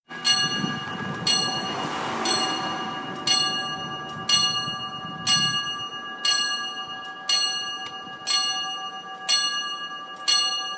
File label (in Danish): tog